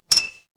glass clink

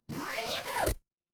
paper fabric zipper

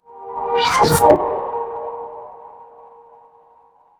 magic scifi teleport

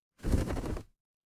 paper fabric fabric

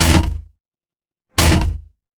mechanical gear

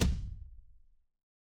transition impact hit

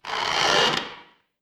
door creak

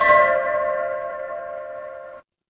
instrument singing bowl

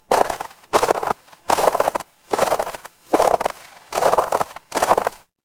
footsteps snow

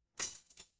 glass shatter

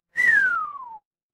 cartoon slide whistle

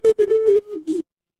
ui call end

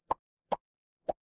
cartoon pop

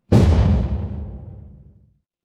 transition braam

